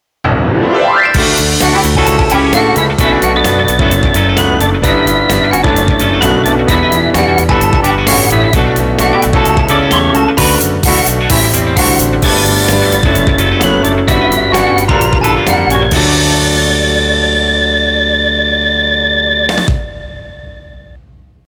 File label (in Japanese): エンディングっぽい曲